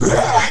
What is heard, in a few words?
Pain; player; skelebot